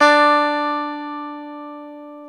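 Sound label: E 12 String guitar; Club-50 - Foundations Roland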